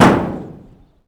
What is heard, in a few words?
mtlhit